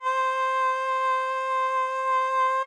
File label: ahh; bespoke - Floral v.1